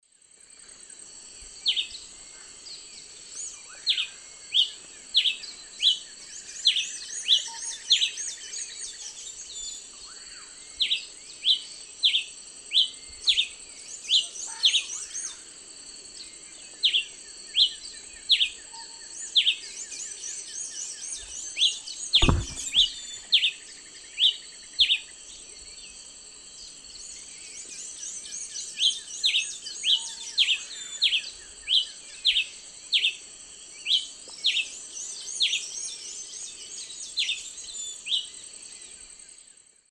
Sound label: Ramphocelus nigrogularis, song; S. America; Eastern Lowlands